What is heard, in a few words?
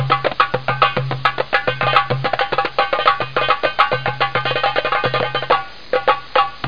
percution fu fu